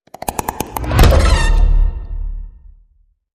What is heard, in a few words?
Grinding Teeth Goosebumps Hit